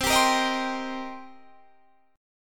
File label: Eb 6th C, guitar